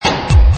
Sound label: cut beat